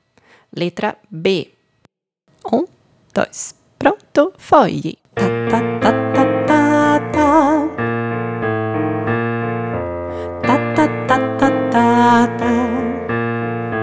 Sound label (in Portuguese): SOLFEJO Melodia Cantar em Eco